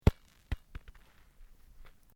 Sport Soccer Bounce To Rest Gravel, Soccer ball sounds